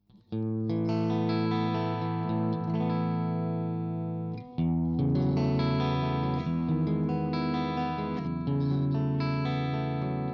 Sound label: clean Position 3 KSM313 front